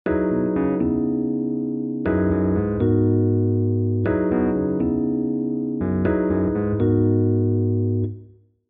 Layering Instrument Piano

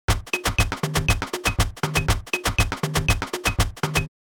Mixing Percussion Raw Percussion Mix

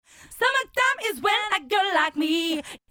De Esser Processed Vox

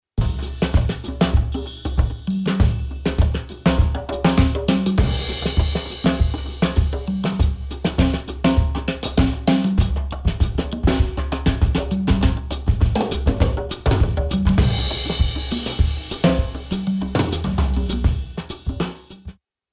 Parallel Distortion Drums processed

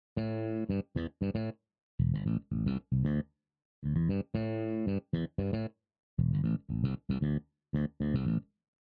Layering Instrument High end Bass

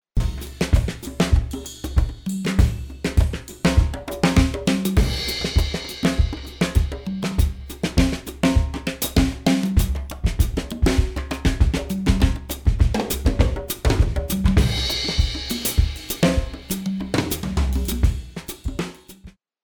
Parallel Distortion Drums unprocessed

